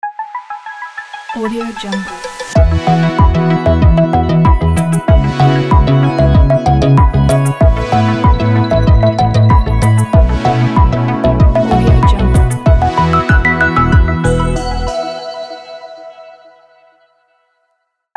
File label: optimistic ident